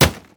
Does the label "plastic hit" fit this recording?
Yes